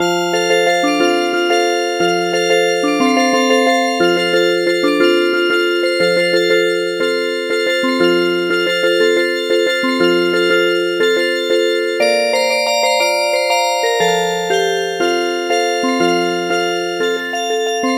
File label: Music Box, midi